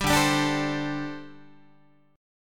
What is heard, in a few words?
D 7th Suspended 4th, down, Guitar; D7sus4; strummed